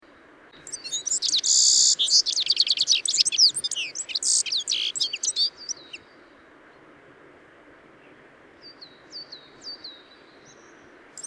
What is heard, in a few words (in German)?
Zitronenzeisig; Wank GAR; Michle Pron